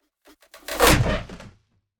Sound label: Cinematic wood whoosh